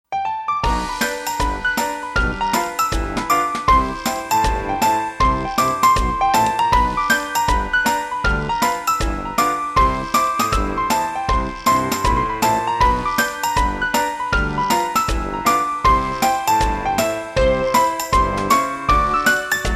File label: Big Bass